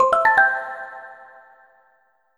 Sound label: timer done